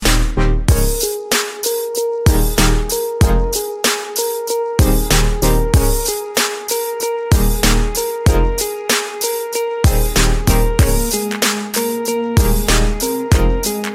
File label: Ringtone